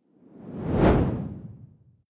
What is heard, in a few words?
whoosh simple hard low